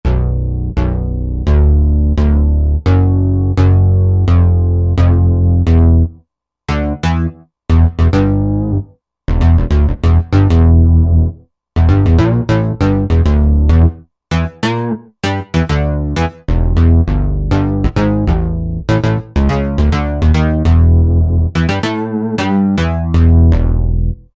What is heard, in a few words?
Maxi Synth Bass, Korg Wavestation